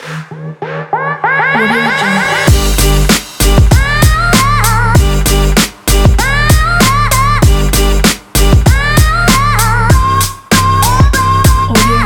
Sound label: hip hop